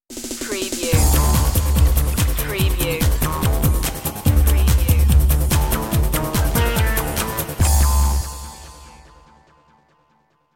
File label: time machine stinger